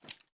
inspect

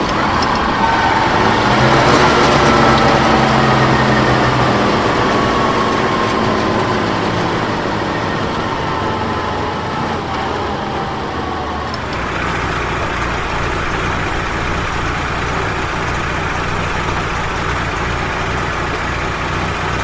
slowdown, vehicles

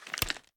inspect end; reloads; gmod

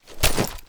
drop; gmod; viper; weapons

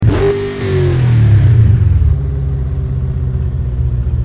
shelby rev short loop; vehicles

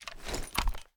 inspect; gmod

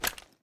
fast reload exmag end; gmod

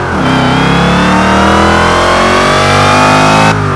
second, vehicles; bowler

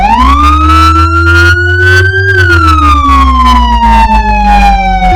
wail; sgmcars; vehicles

